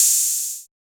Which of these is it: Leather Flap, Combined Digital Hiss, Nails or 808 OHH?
808 OHH